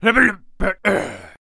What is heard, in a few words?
Knight Death Vocals